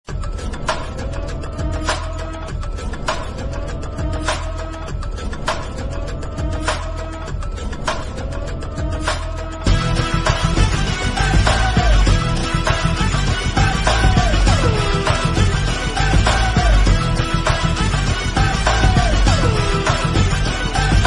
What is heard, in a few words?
Azeri Bass Music Ringtone; ringtones